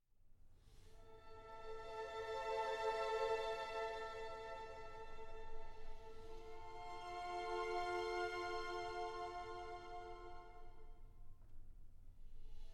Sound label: version for cello and orchestra; El cant dels ocells (Song of the Birds)